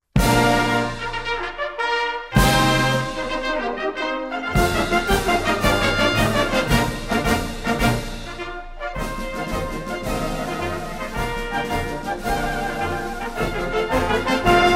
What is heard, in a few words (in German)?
Marsch; Marsch des Neusser Jaegerkorps von 1823